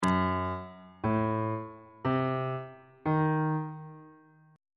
g harmonic minor 7th chord 7 is f sharp diminished 7th on bass clef